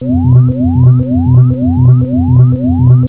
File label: ufo